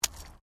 Digging Small Handheld Tool Rocks; Various digging tools and processes; Construction

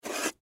Packaging Tape Pick Up Table; Office; Blastwave

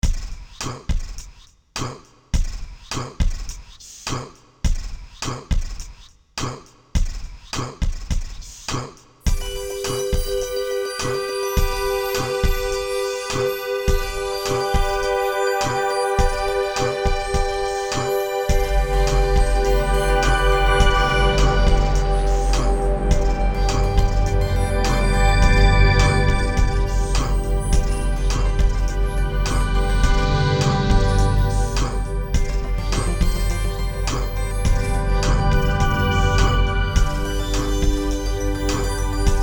BEAT BEATBOX, Beat Beat Box Sweeper Synth; Miscellaneous Loops

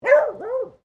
ANIMAL DOG BEAGLE FEMALE BARK

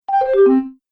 Happy Cartoon Descending Sound; Cartoon Music Sound Effects

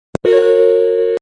Funny little cartoon sound; Children Sound Effects; Human

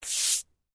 Household, Cleaning, Brush, Scrub; BlastWave

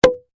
Foley, Champagne, Cork, Pop; Bars

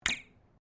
METAL ON METAL RAILING

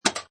Pinball sound, metal clank with return; Video Games

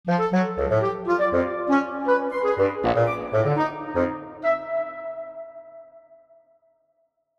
Woodwinds Melody, Woodwinds Melody Weird Frumpy; Cartoon; Musical; Blastwave

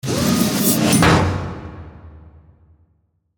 Industrial Mechanical Swirl To Hit; Mechanical Button; Blastwave

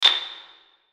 Hit Filter High, Hit Filter High Reverb; Blastwave

